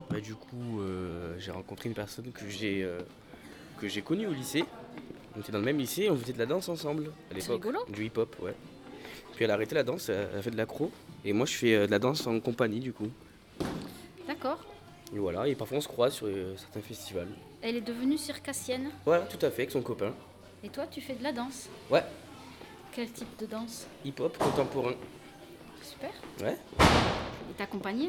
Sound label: Hip Hop